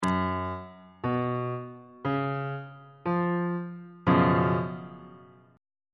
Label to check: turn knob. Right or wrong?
Wrong